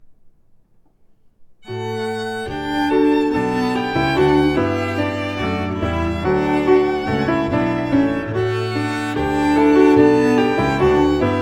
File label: Ode To Joy